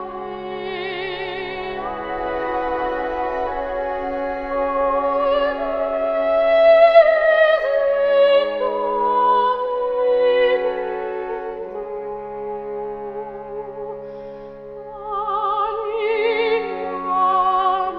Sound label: voice strings weary; Loops; Sad